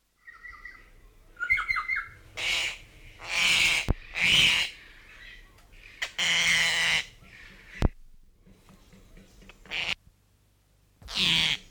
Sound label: north island kaka, bird song; conservation, native animals, birds